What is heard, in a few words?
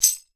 TAMBOURIN; Voice Crystal - Steve Reid Definitive Percussion; TAMBOURINES